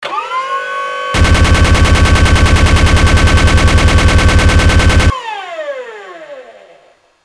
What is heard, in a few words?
minigun; hl2dm